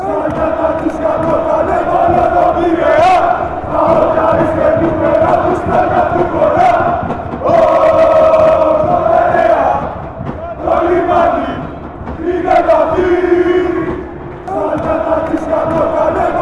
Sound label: chant, fanchants; olympiakos